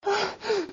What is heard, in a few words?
Pant